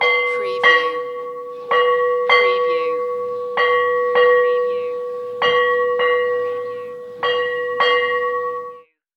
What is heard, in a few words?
Vintage School Bell